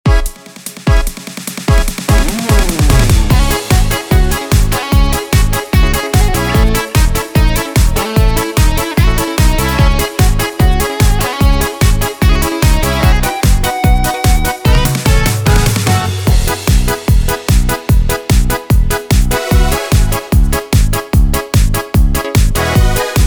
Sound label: Midi Yamaha; yamaha psr s750